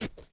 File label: Footstep Gym Mat